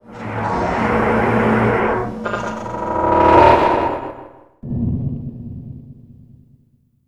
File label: CRANK BOOM; COMPLEXHITS